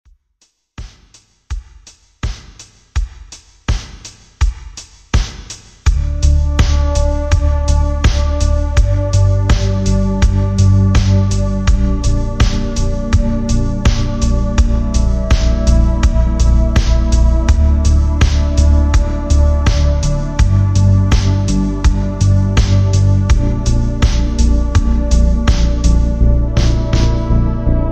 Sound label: retrowave version slowed reverb